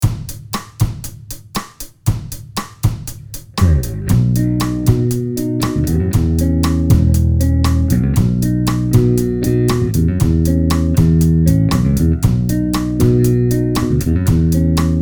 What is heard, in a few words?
rhythm tracks; berg ae112; ae 212 cabs